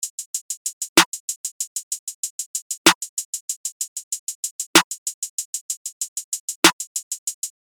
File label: starter pattern